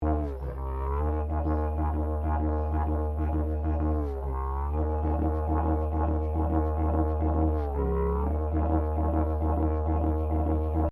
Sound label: Didgeridoo 3 Part Slide Didge Modern Drone Loop, Plastic telescopic didgeridoo; Plastic telescopic didgeridoo, modern design; Miscellaneous Musical